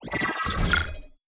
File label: ui level complete